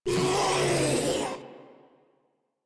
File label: Vocal Attack; Warframe; Proto Infested Ancient